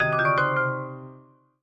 SFX Incorrecto; trivia crack

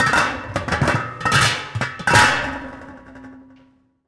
TRSH CAN, SMASHING; 1000fx

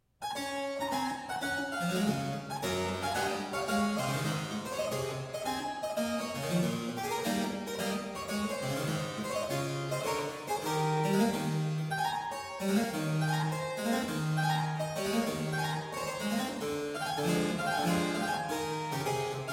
Spirituoso E Staccato, Streicher Trio

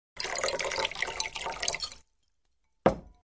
Liquid Poor From Bottle; Hollywood Edge Foley Sound Library

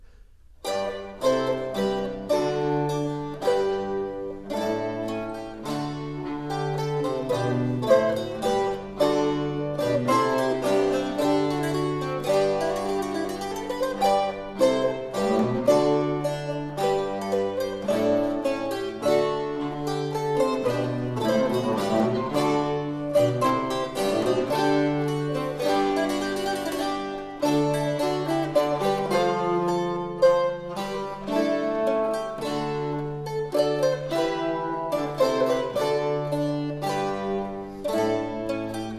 Galliard (Cittern with a bass)

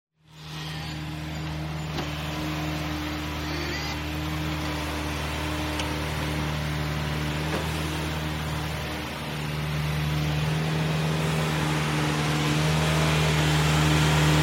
Steam shovel; SOUND EFFECTS1

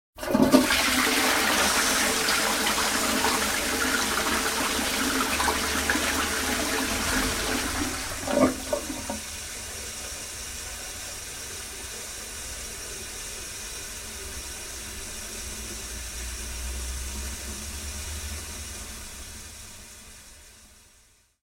Toilet Flush; 100 sound effects

